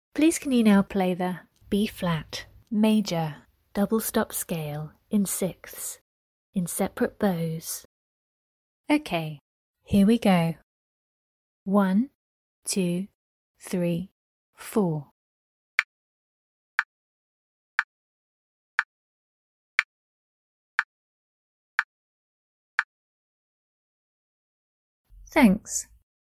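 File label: B flat major double stop scale 6ths separate bows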